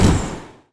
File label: explosion mystic; Epic Toon FX